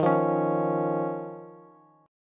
Eb 7th Suspended 2nd, Bass; x,18,15,18; Eb7sus2 strummed; JGuitar

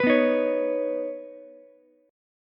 B Major Add 9th, Ukulele; strummed; 16,11,11,16; JGuitar

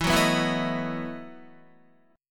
E Major 7th Suspended 2nd Suspended 4th E, Guitar; EM7sus2sus4 strummed

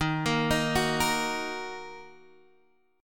D sharp Minor D sharp; D#m arpeggiated; x,6,8,8,7,6; Standard

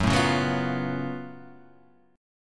F sharp Minor Major 11th, guitar; chordshape; down

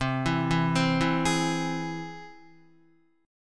C Augmented, Guitar, chord; C+ arpeggiated; JGuitar

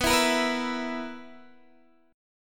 B Major 7th Suspended 4th B, Guitar, Standard; strummed; x,14,14,15,12,12; JGuitar

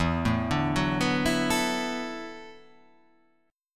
E 7th Suspended 2nd Suspended 4th, down

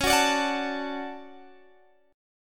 D Major C sharp, guitar

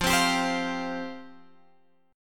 F Suspended 2nd F Guitar Open F; strummed; x,12,12,14,15,12; JGuitar

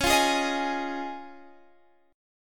Db Major Add 9th, Guitar, Standard; strummed; JGuitar

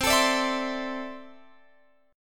C 6th Add 9th C down Guitar Open F; C6add9 strummed

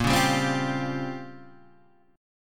A sharp Minor 6th Add 9th A sharp, Guitar chord; A#m6add9 Chord {6 4 5 5 6 3} strummed

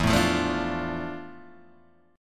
D Minor E, chord; Dm/E strummed; JGuitar

